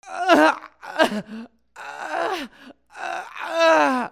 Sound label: PEOPLE